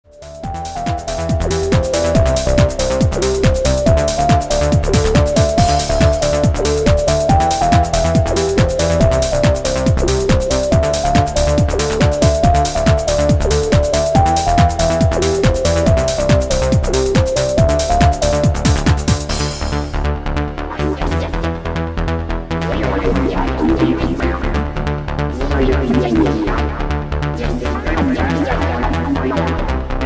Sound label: Trance